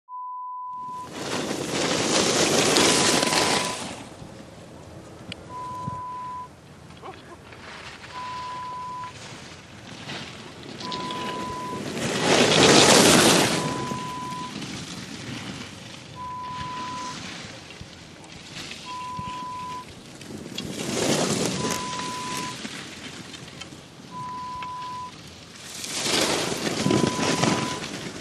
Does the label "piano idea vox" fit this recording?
No